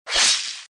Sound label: Sfx Dark Lord Attack Hit